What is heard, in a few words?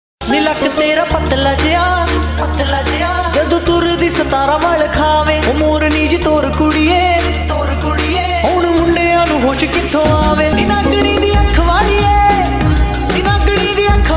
remix ringtone, bollywood